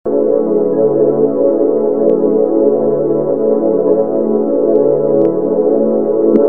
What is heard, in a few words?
Loop